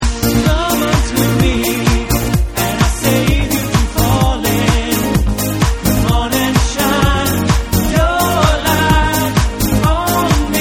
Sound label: Shine ringtone